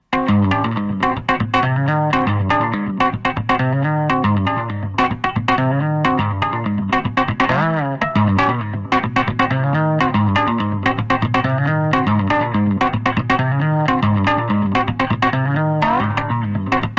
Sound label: bass